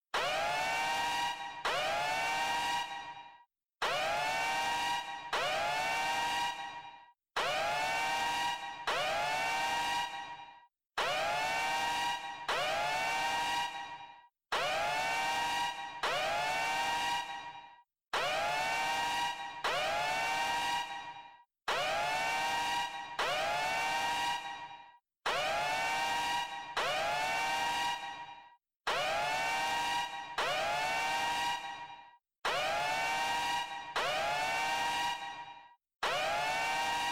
alarm; scp

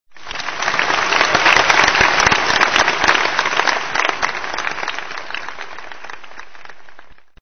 clapping

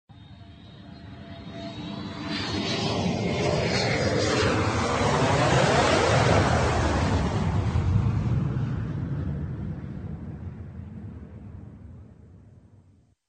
concorde pass

